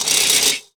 CYBER KIT, DRUMS

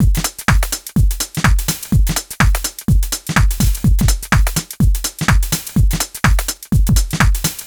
Full Loop 125, Loungin House; Equipped Music

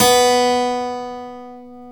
KEY HRPCR, Roland; Roland - LA Composer vol. 1

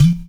EQ Perc, Percussion Mix

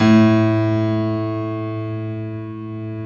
A1, GRAND PIANO; InVision Interactive; Lightware VOL-1; Instruments & Percussions

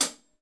HH HH, HH Real

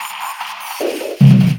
Jungle Bumpers; Sonic Foundry (Sony Creative Software); Esoterik Beatz; Jungle Fx

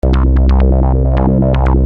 Syn-Bass m C, Techno Synth Loops, 130bpm; Sonic Foundry (Sony Creative Software); Signals I

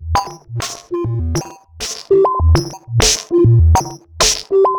Robo Beat 100BPM, sci-fi Beats

